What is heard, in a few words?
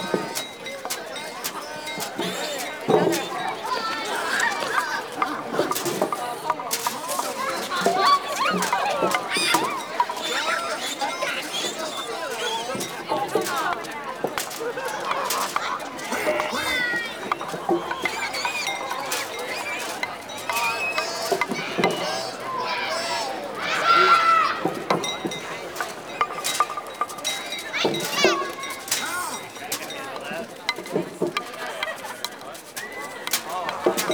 Ambient Loop, Village Exterior; Warframe; Eidolon Plains; Ostron Village; Ambience